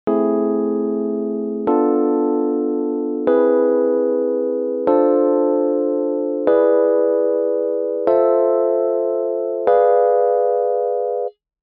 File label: A Minor Tetrad; masaakiwada; Theory